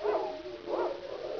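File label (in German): Wölfe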